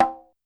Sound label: Conga H Open; YAMAHA RM1X